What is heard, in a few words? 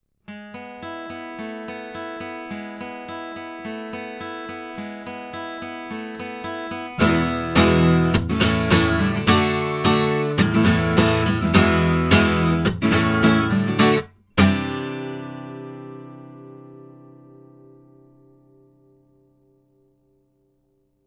guitar Gibson; Pearl CC22